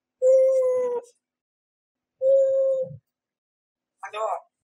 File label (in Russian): zhako zvonit, popugaya zhako